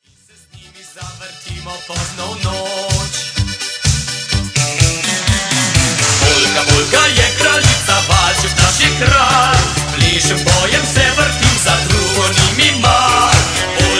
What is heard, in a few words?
Polka